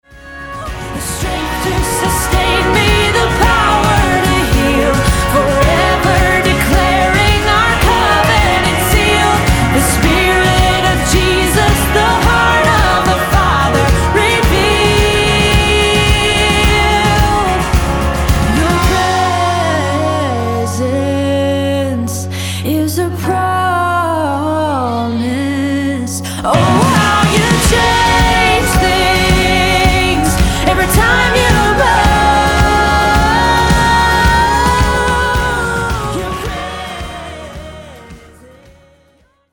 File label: DRUM BOUNCE